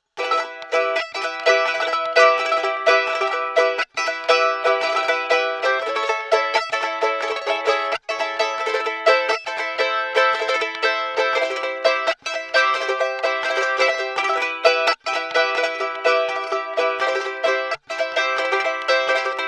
Charango strum RAW PIEZO

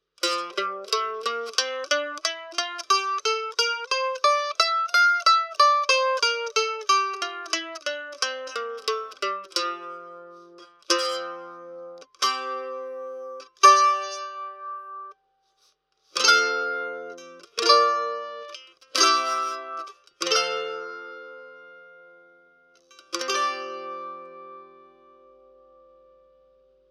Mandolin RAW PIEZO

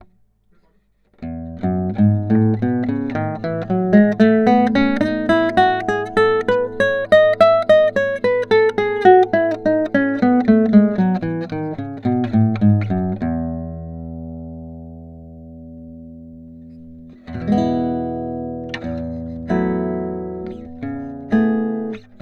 Guitar classical PREAMP

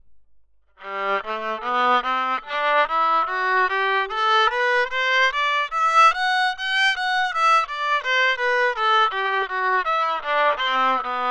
Violin PREAMP

